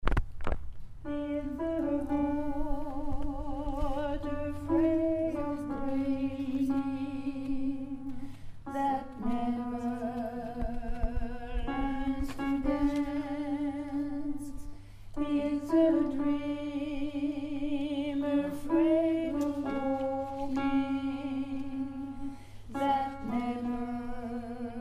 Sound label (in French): alto